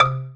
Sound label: Marimba C2, E-MU PX-7 drum machine